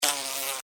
Fly Attack Buzz, Animal, Fly, Attack, Buzz, Wings, Insect, Bug